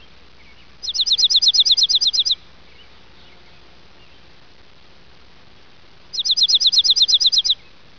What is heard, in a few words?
dark-eyed junco